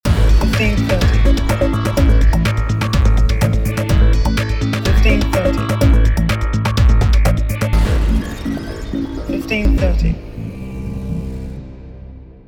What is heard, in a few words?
Glitch 125bpm; GlitchDefnition